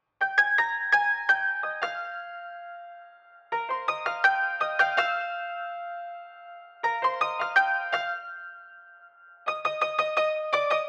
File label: old piano intro, Smooth RnB; 081 Cmin